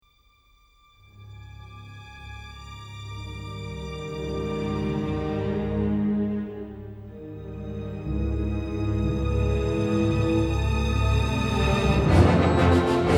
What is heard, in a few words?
Battle Stations